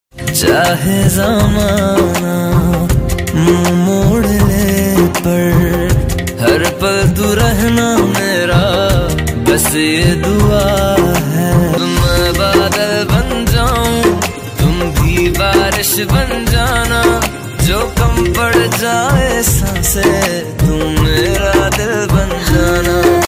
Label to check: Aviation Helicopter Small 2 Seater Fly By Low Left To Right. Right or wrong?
Wrong